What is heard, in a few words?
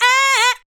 ADLIBS 90; Ueberschall; Jam Box